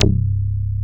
REZO BASS; Masterbits; Dance Trax

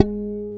GUI HRM FLA, Acoustic

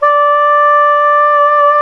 SAX SOP SAX, Soprano Long

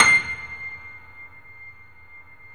The Piano, sustain

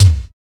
BD, BD Dance; Sound & Vision; Gigapack I CD 1

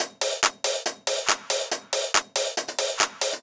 drum Loop 140BPM Full; Vengeance - Essential Clubsounds Vol.5; VEC5 Drumloops; VEC5 Multi Loops 140BPM

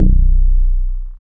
BAS FM DX-7

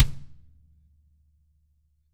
FELT, drums; ILIO; Double Platinum Drums 1

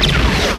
LAZERMGF; Best Service; Advanced Mega Effects

